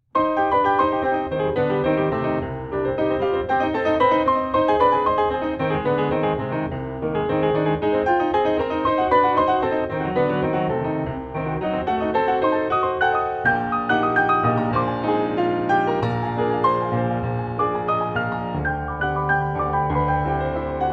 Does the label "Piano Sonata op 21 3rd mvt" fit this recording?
Yes